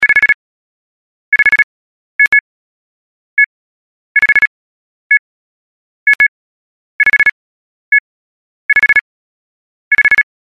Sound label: ringtone; redbox; PLA Ringtone Collection